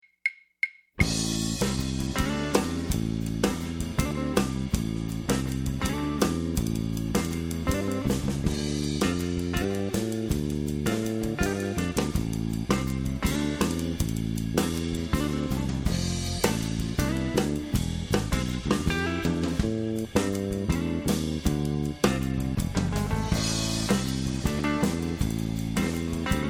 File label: 12 Bar Minor Blues with Mixed Meter, bass guitar